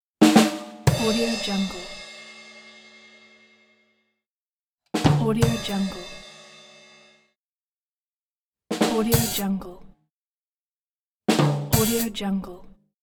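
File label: ba dum tss drum sound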